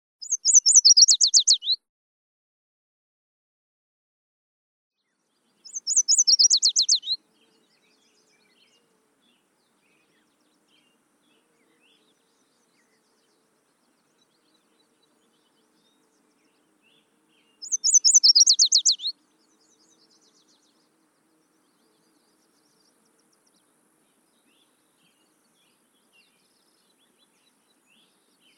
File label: warbler; bird sound